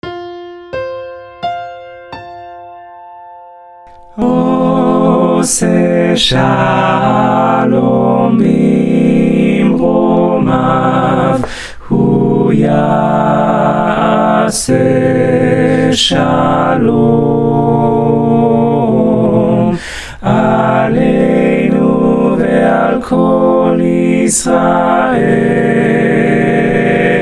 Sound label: spanish all vox; osseh shalom; BCC choir